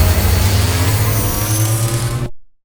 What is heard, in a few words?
retch powerup; Quake 4 Alpha